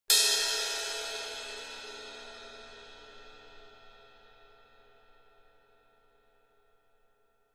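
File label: Cymbal Medium Single Hit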